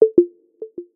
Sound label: Disconnect